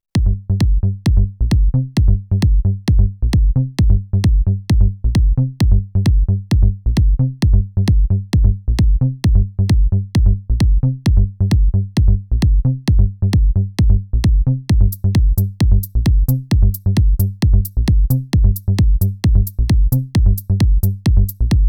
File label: Tech